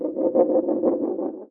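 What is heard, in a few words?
assassin enchant poison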